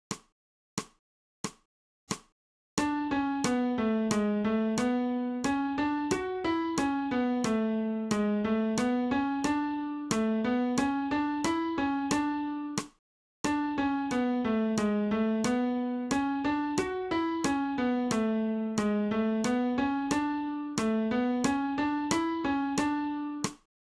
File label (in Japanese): 新フレットレス